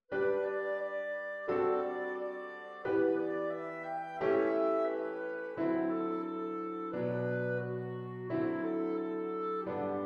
clarinet and Piano; To A Wild Rose

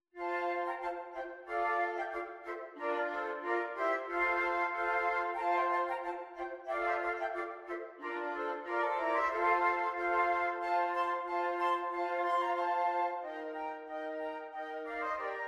Jig Flute Quartet; Kemps Jig

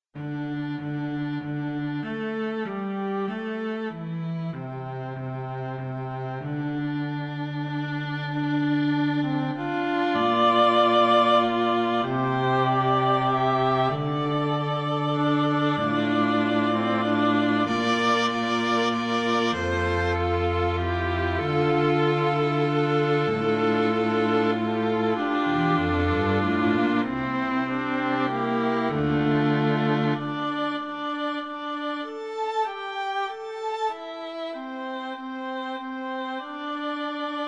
String Ensemble

